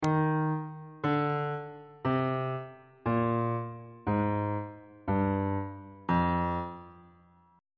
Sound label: e sharp dorian mode descending on bass clef